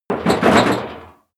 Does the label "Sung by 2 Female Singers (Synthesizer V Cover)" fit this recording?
No